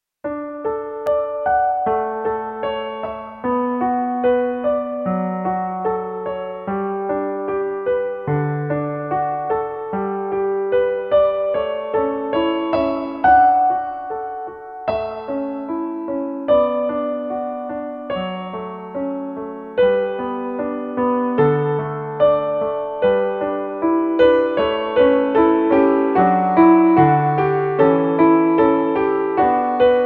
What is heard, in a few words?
canon piano